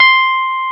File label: piano Rhodes